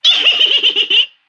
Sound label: vox laugh small